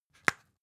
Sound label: spice jar open